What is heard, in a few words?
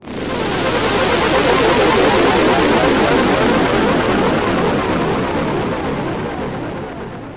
ravy downfilter; fabrik plus